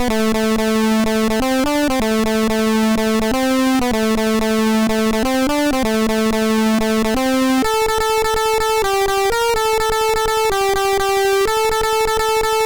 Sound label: Techno, MOS6581R4; Froyd